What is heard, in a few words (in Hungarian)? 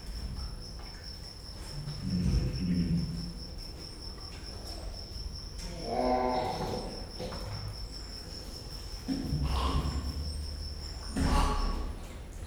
téblábolnak, gallérospávián; gyori zoo 2014